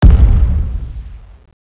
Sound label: crossbow exp, weapons